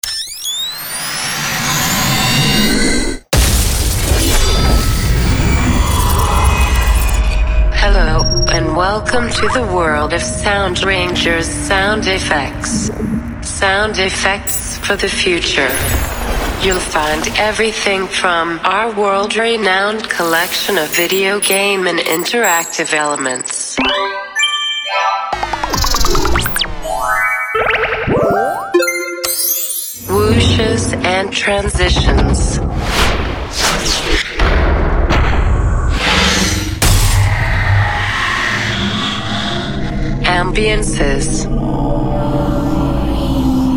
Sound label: sfx